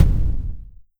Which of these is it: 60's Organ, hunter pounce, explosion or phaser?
explosion